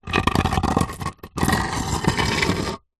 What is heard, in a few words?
concrete block small move scrape on dirty concrete